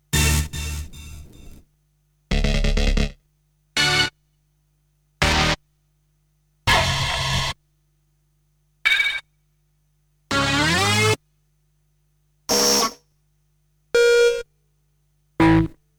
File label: Stabs; Kniteforce; 1000 Samples Volume 1